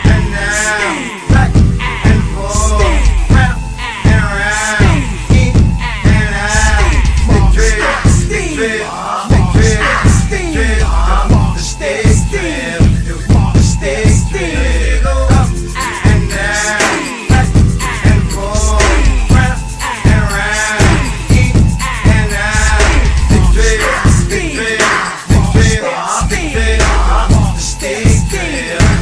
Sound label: piccalo stick drill